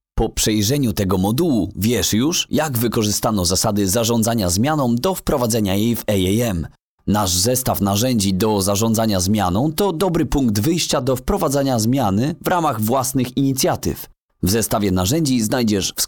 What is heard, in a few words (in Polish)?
modul elearning przerywnik